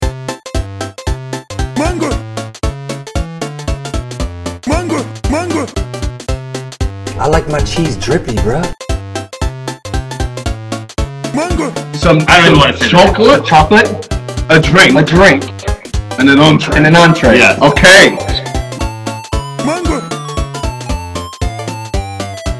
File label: bg music